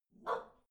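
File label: bark small, dogs; natur-tiere; hunde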